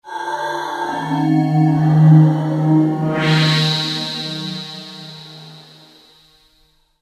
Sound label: intruder fx, D-50